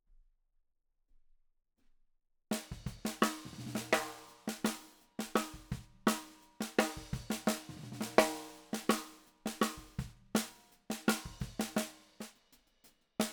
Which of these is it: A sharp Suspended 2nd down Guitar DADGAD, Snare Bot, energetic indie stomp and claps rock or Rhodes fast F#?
Snare Bot